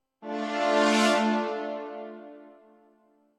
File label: Sting Death